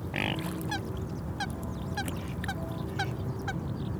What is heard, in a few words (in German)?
hoeckerschwan